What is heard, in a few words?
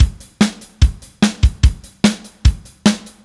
Beat, Indie Rock; Silos; Sonic Foundry (Sony Creative Software); Indie Rock Anthems; Session 11 - Silos